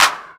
clap single shot c sharp key; single hits one shots

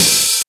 damped open hi hat sound a key

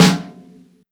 dark sounding acoustic snare f sharp key

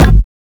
thumpy kick G key; Duration 0:01; Samplerate 44100 Hz; Bitdepth 24

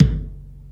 short reverb kick drum sample f sharp key

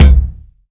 rich mid range kickdrum sample c sharp key

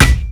original kick drum one shot c key; tuned drums

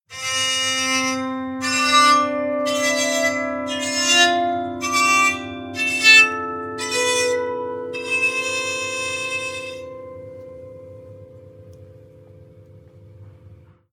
soprano diatonic psaltery scales; loops

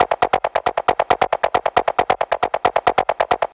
techno arp sequence shaker like 136; synth loops

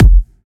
steel kick drum sample f key

